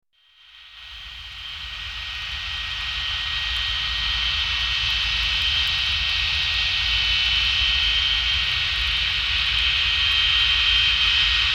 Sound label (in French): moteur avion